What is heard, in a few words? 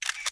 Camera Shutter